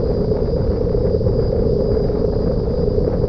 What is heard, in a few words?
warp engine; Earth and Beyond; EA Games